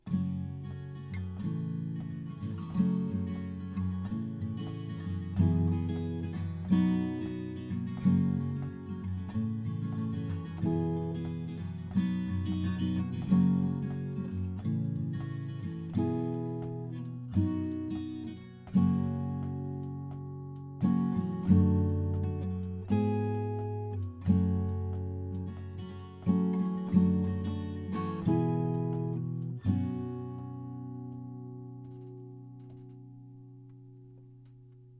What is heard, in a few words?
chords